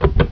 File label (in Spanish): interruptor